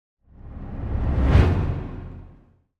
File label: Symphonic Hit Symphonic Drum And Strings Finale - Increasing; Hollywood Edge; sound designer's toolkit v2